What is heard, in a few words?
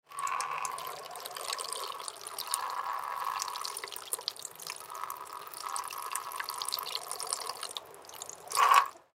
Human Urinate Pee; Urinate Pee In Porta John Urinal Element Male 25 Yrs; Urinating; Human Urinate Pee In Porta John Urinal Element Male 25 Year Old; Blastwave; Humans